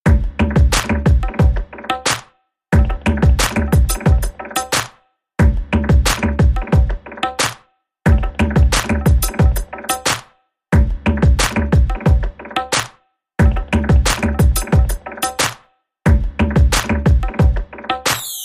Slumdog Beat

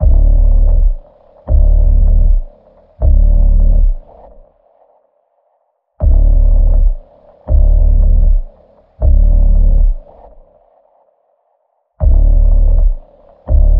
D, Key of D

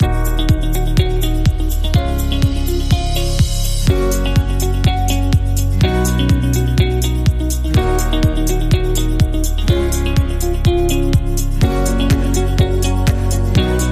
Underscore

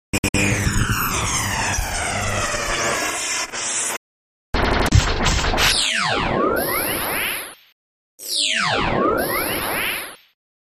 Solar Static Lasered

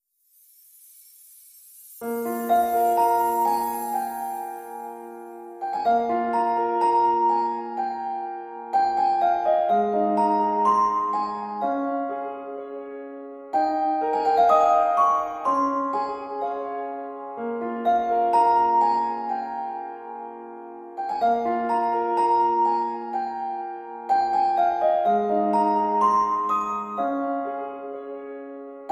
Bells Only Mix

